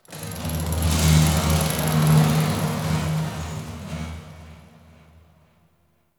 Metal Movement Texture Large, Corpus Ship ambience; Textures